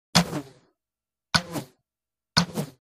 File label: Crossbow, the arrow flies by; cold weapons